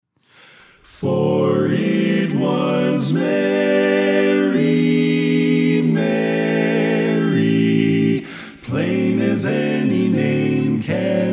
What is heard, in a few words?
Tenor Left